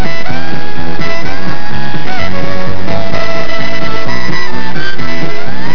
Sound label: fiddle